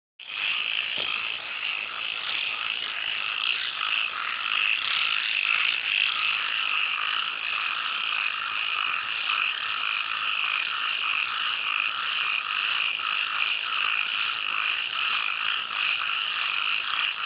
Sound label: Moganshan creaky frogs